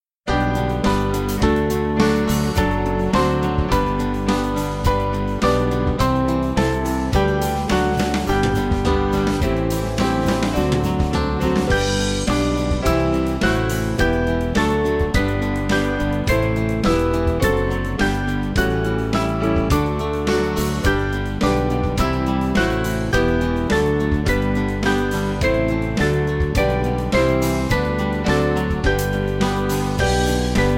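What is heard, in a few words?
Music Backing Files for Churches; Temple Of Gods; Groeswen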